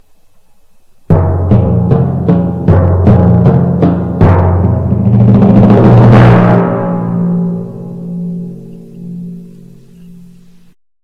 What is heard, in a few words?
timpani